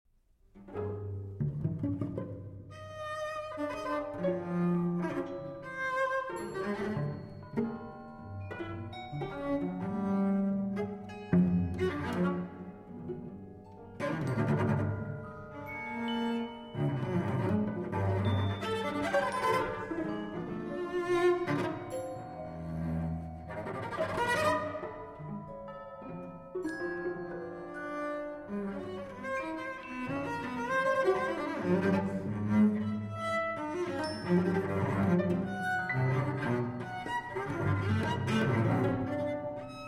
Cello Concert III. Doppio Piu Presto (With Orchestras A & B)